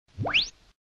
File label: sharp wheep; disney